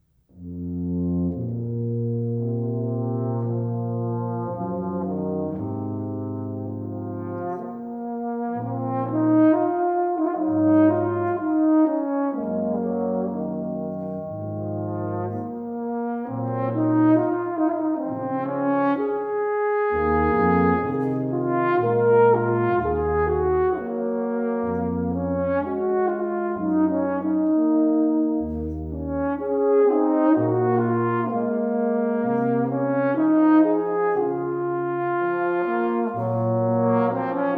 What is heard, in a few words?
Blues